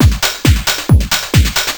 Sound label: Boom Chak(135), Beats